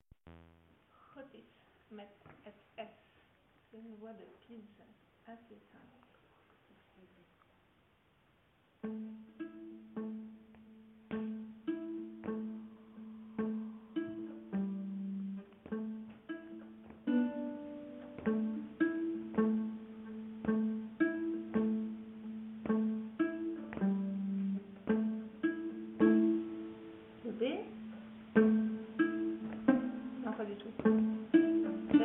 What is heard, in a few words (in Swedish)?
Schottis Med Ett Pizz, nyckelharpa